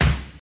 crispy kick single hit c key, kick one shot tuned to the C note